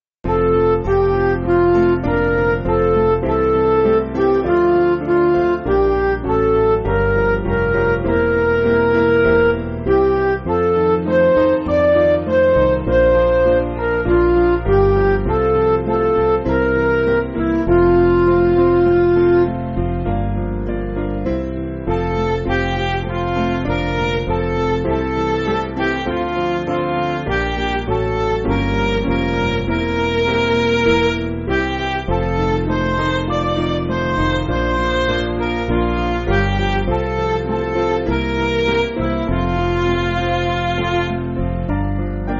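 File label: Music Backing Files for Churches; Dalehurst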